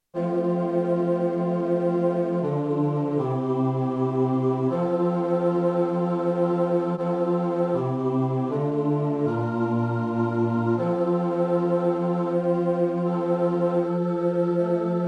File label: Bass, rehearsal